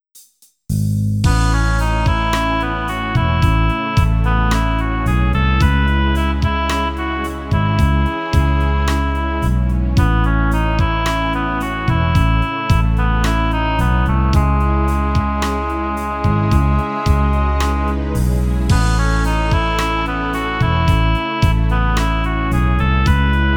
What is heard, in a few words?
Sad Version, Sad